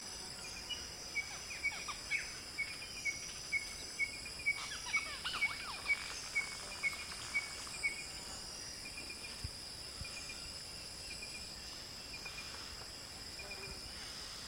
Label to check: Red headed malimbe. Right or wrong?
Right